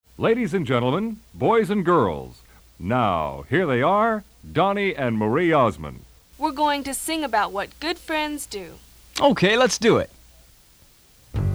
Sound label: Song 5 - Friends (Long Vowels)